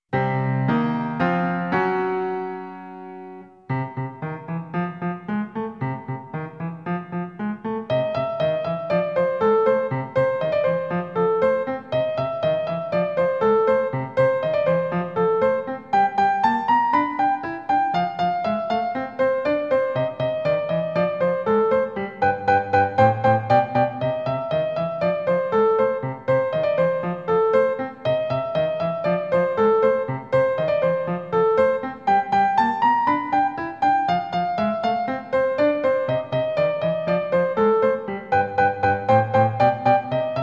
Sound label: Enemy Battle; NINTENDO